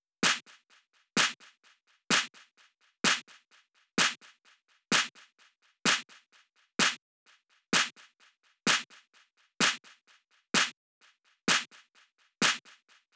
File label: Drum Loop 128BPM Clap Add; VEC5 Drumloops; VEC5 Multi Loops 128BPM; Loop Elements